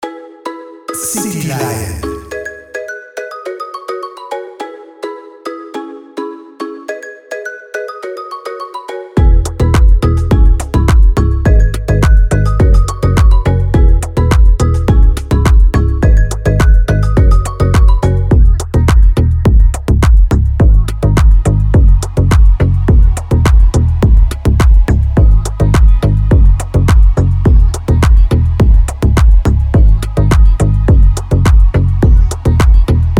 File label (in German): Musik